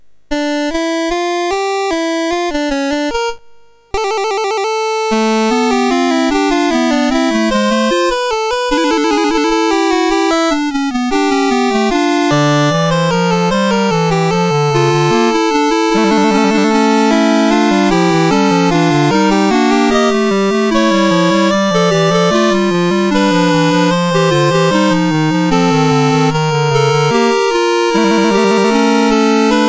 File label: Fugue VI in D Minor, MOS6581R2